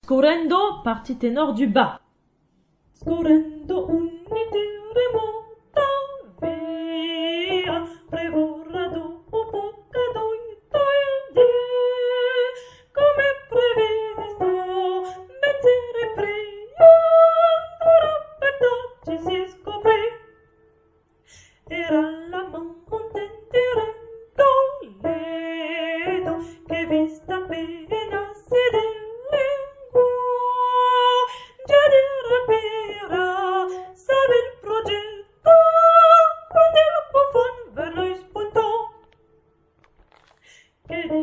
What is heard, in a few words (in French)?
Tenor Bas; Scorrendo; Rigoletto; Verdi